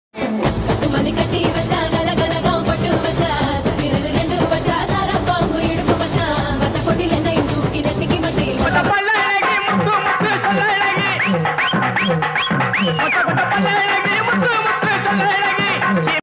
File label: song funny tamil; copycat